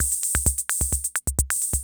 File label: CR78